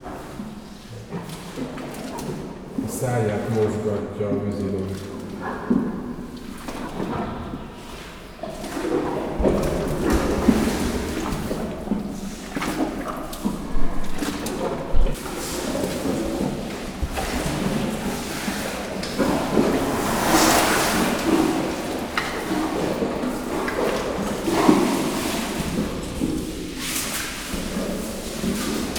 lakmároznak, nílusi víziló; pecsi zoo 2017

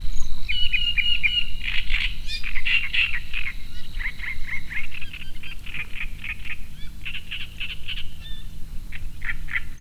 cserregonadiposzata naditucsokmadar; termeszetben

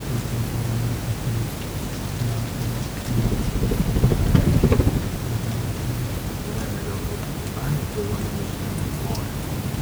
barlang néhányuk beszéd, denevérek; repules; termeszetben

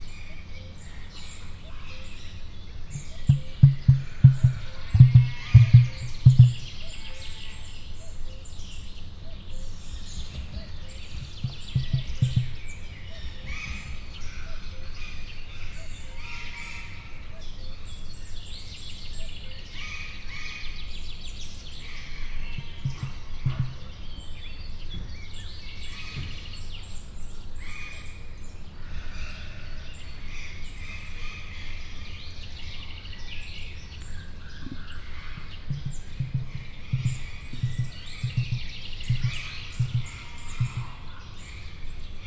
elaprozza, emu; miskolc zoo

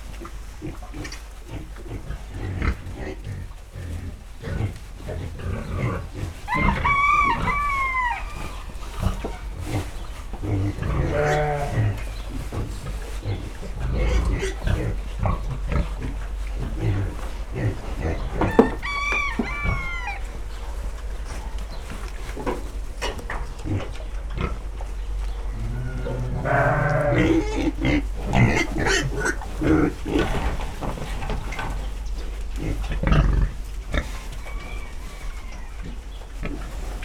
visítgató disznó, kakas, birka, istálló; állathangok; paraszt udvar

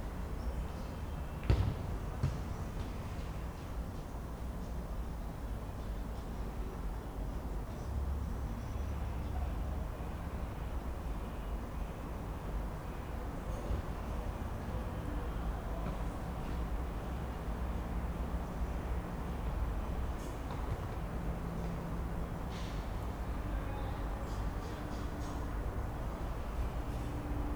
kettois, focizo gyerekek, helikopter; Angyalföld

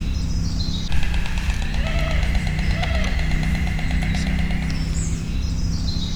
kelepel menet közben, fehér gólya; szegedi zoo 2011

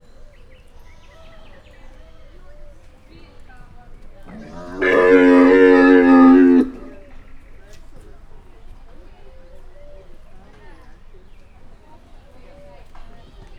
kan allat hangja a nosteny utan, ketpupu teve; Győri Zoo 2014

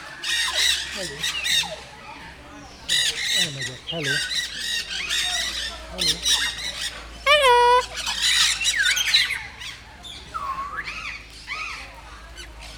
elmegyek helo, sárgabóbitás kakadu; nyiregyhazi zoo 2019

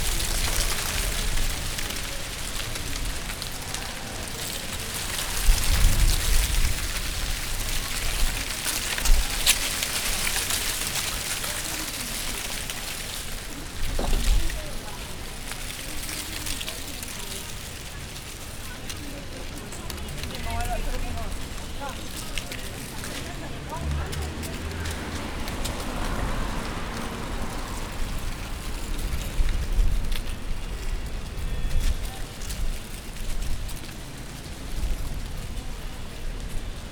úttesten zörgő falevelek szél

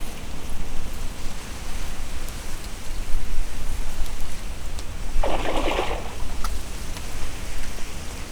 nádasban felvetí magát szél, ponty; termeszetben; recsk

